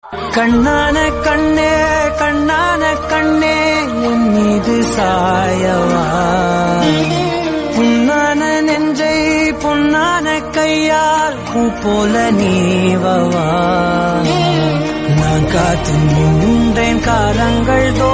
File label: Sad Tamil